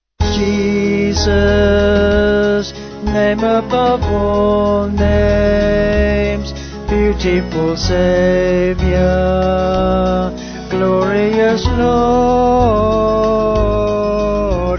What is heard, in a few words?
Vocals Band